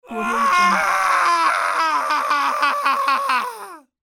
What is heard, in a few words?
male screaming